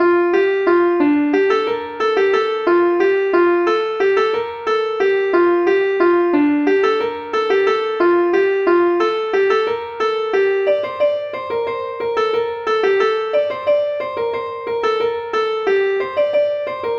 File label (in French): suite ronds